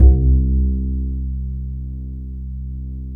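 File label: DB C3, double bass; Balkanish Traditions; USB Soundscan vol.63; AKAI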